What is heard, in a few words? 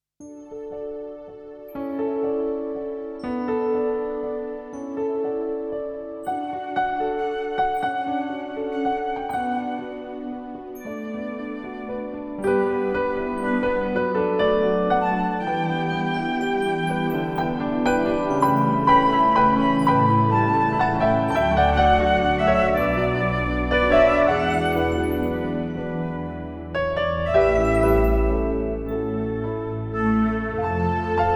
cadenza